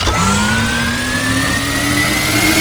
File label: giant heavy gun wind up; brassbeast